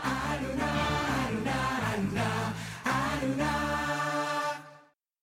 Jingle

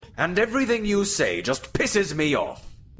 RNB

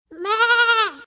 sheep message tone; ringtones; sms

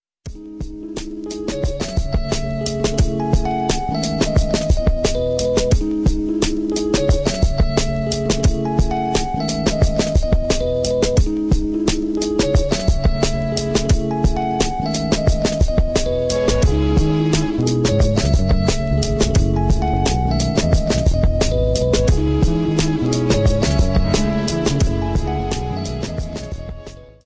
instrumental

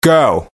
Goooo

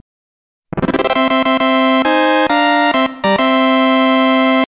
Fanfare Jackpot

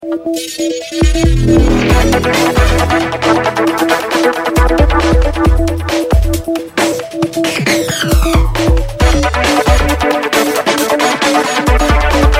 menu theme

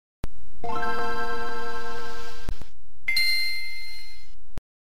Game Boy Start Up

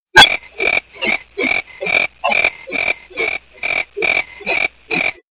Cricket msg tone

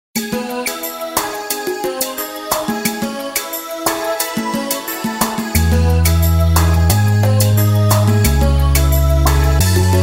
Zouk

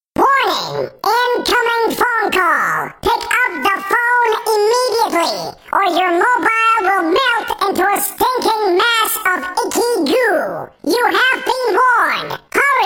Warning call

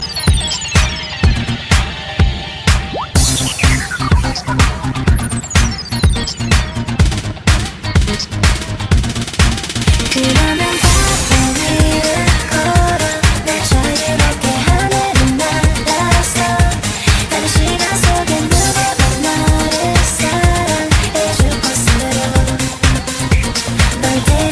korean techno

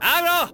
Yelling, ringtones

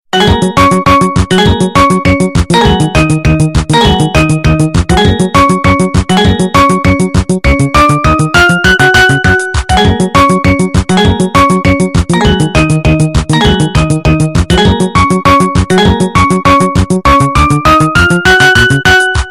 Siemens Carousel

